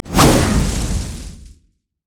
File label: javelin toss; nidalee base